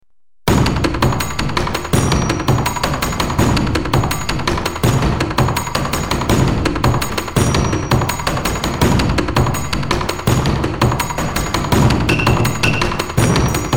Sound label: taiko